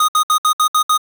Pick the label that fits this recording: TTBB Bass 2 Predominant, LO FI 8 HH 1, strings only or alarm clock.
alarm clock